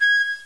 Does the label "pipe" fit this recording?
Yes